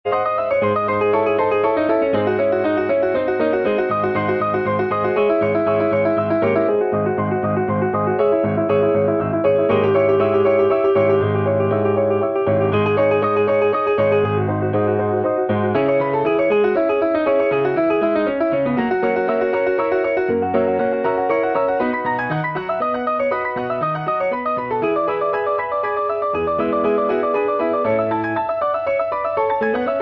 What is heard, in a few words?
Partita in E Major